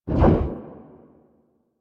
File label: Dash Burst; Powers; Movement; Warframe; Focus; Operators; Wraith